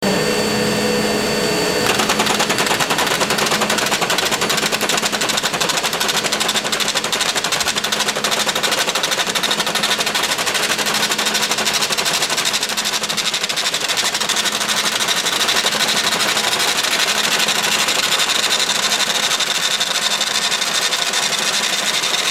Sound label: IBM 1403 Chain Printer